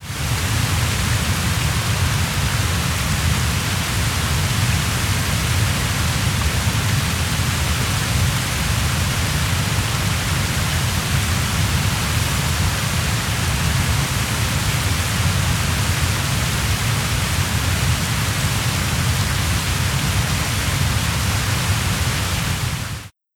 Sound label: Niagara falls Waterfall